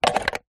battery remove from plastic battery charger

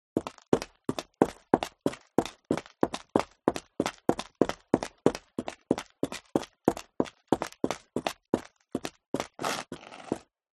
FOLEY STEP High Heels Concrete Grit Run Sequence; smartsound

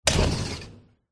door sliding glass open

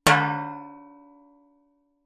impact metal tray med object strike resonate

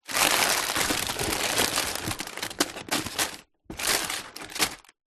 food potato chips packets movement in card box

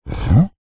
cartoon dog confused huh vocalisation; zapsplat

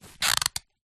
foley plastic bag very thin rub hand squeak; zapsplat

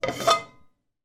household wok remove from stove

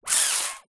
human suck air through closed lips mouth

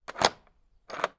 household bolt unlock lock wooden gate; sound effects one

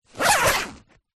foley bag backpack zipper; zapsplat

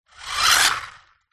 leisure radio controlled car pass; zapsplat

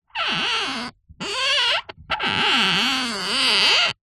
string rub wood squeak; foley

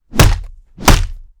Heavy punches; foley walkers

